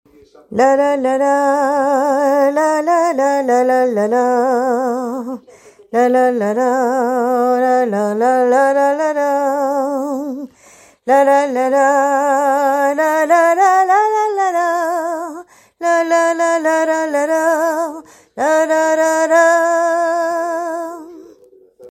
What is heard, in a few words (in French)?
Lalalala tierce